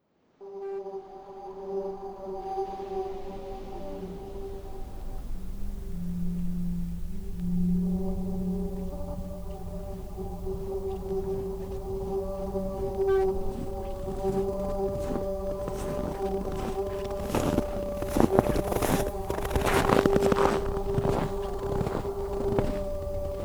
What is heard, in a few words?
howls; The sound from a man walking in snow. A wind instrument howls in the background